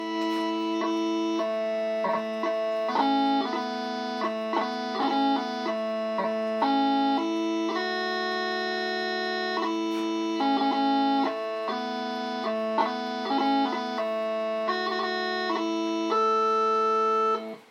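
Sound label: Auld Lang Syne, bagpipe tunes; new years & robbie burns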